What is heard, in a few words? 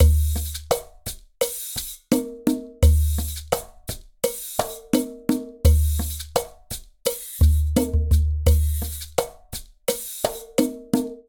Guajira 85bpm, Latin Percussion; Ableton; Factory Packs